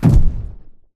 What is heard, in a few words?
footstep generic dist; Titanfall 2